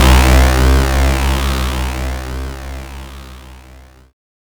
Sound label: Anasound Long, Industrial Analog Single Notes; Sonic Foundry (Sony Creative Software)